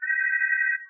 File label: alarm, computer; federation